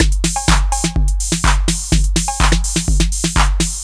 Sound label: drum loop eight full 125, Classic Chicago House; Sample Magic; Drum Loops